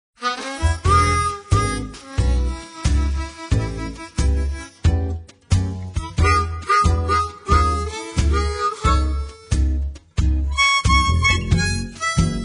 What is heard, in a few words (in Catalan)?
blues en sol cantat en mi